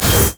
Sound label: riposte; LoL; fiora